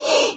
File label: asp hurt